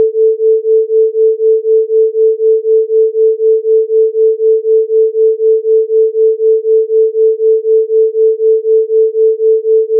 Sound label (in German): schwebung